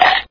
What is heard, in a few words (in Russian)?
zvonkij tresk